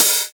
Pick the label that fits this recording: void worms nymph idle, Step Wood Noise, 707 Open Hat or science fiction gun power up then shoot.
707 Open Hat